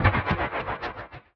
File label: Perc, drums; Touch Loops; BlissedOutBeats